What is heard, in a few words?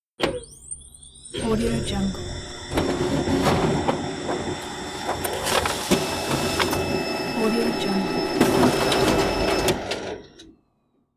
document photocopy